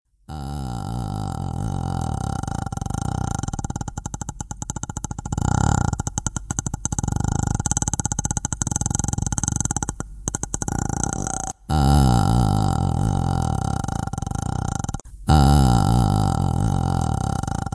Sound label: Aaaahhh